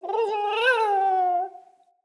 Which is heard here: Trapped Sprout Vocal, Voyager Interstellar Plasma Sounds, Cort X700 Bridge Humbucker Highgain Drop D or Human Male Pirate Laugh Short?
Trapped Sprout Vocal